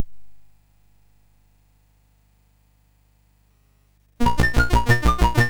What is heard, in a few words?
Chords, MOS6581; Galaga Chords BASIC